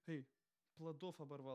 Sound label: vocal